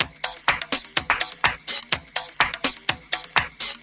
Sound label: 125 bpm garage percussion bright